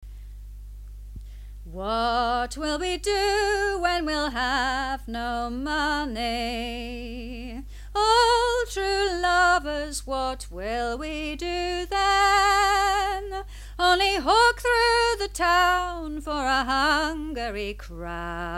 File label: What Will We Do if We Have Got no Money sung with harmony